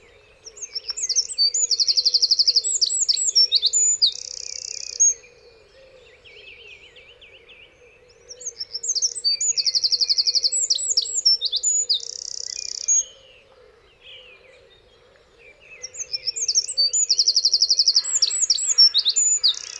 ökörszem hangja

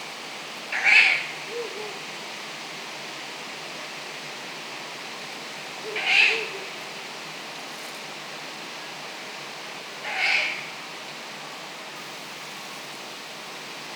uráli bagoly hangja

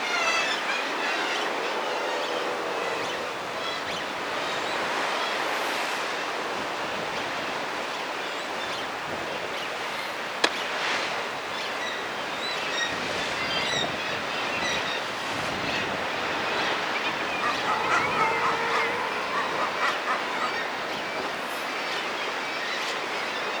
lumma hangja

